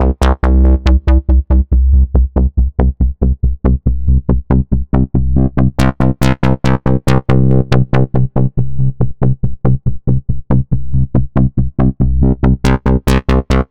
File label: ACID TECHNO